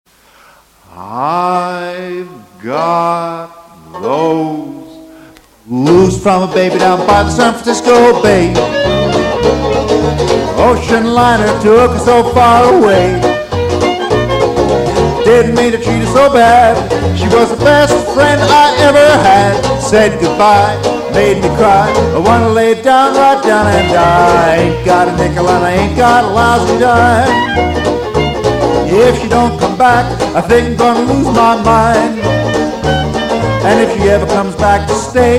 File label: Dixieland Jazz Band; 20s band